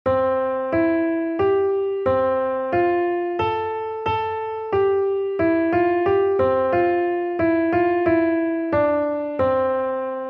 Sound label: melody; christ the lord is risen today